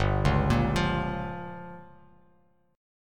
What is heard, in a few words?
G Minor 7th Flat 5th, Bass Standard; Gm7b5 arpeggiated; JGuitar